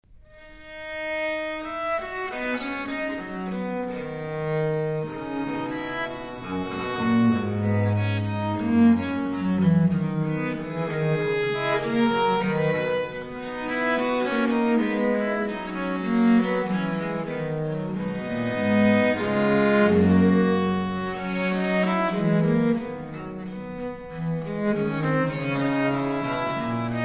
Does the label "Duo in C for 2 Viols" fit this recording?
Yes